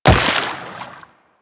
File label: Wall Jump Water; Footsteps; Warframe; Tenno; Wall Run